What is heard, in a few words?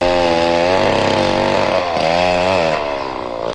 chainsaw